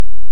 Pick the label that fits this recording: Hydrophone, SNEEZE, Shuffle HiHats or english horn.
Hydrophone